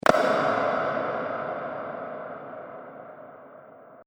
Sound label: Ambient Click Sound